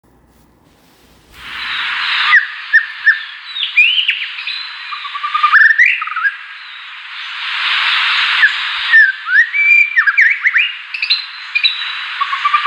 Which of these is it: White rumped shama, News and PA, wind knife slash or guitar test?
White rumped shama